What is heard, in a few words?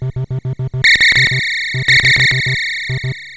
shoot